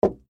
koto

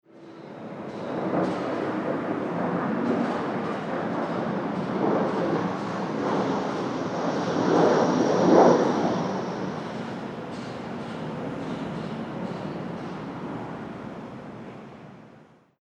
jet

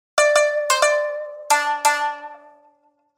Shamisen

